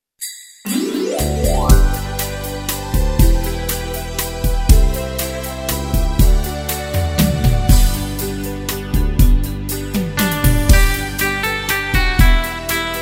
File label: INSTRUMENTAL